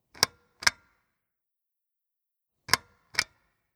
Tischleuchte an aus

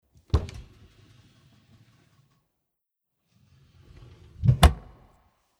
Sideboard Tuere links oeffnen schliessen

